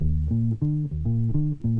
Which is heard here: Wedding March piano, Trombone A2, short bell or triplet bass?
triplet bass